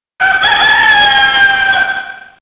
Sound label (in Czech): kohout